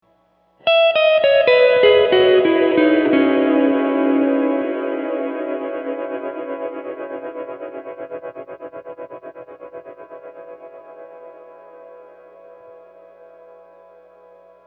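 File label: tube 70s, Strymon Flint, Guitars; Verb Gets Tremmed.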